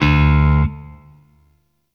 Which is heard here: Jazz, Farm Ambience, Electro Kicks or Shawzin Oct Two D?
Shawzin Oct Two D